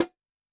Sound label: JNGL SN, Snare; Yamaha DTXpress-II